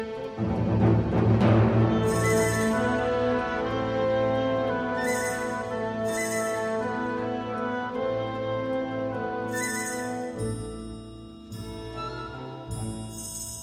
Violin

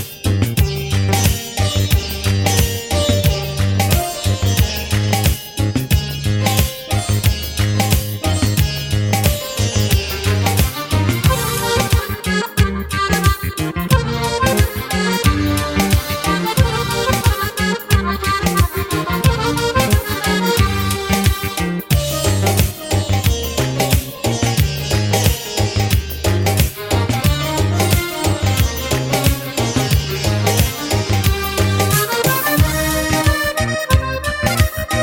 Accordion

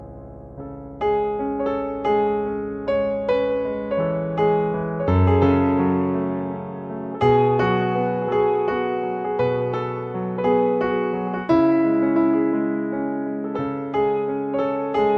Piano

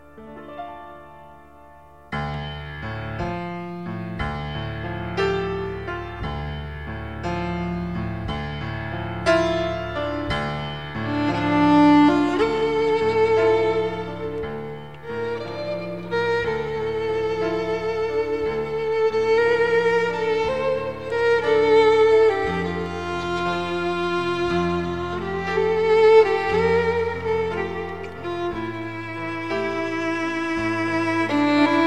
Violin

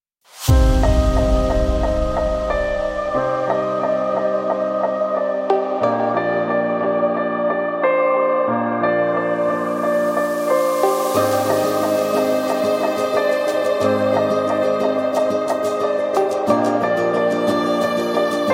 Violin

